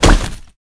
bgd cry

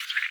p atk tentacle

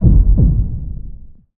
intense heartbeat

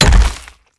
mag close

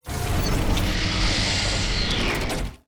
metal door close

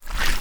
vibe

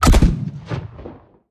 atk mortar

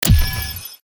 shears

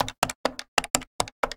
make phone call

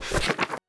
paper flip

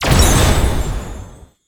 explode

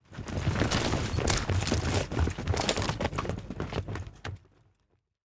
soldiers run

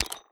ui btn

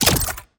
ui equip

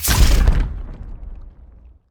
skill dragon fire

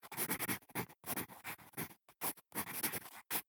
pen rustle

